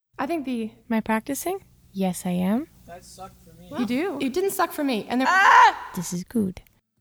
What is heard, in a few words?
argh